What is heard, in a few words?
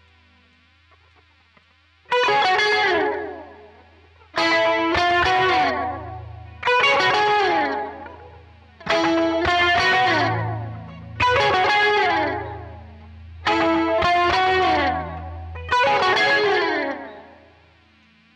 quilter solo